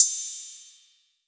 Ride, Cymbals

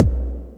kick, DnB Breakz Kit; Breaks N Beats Sound Kit

